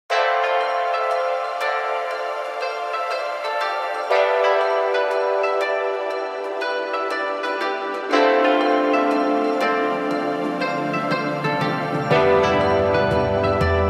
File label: Tokyo Vibe Asian Lofi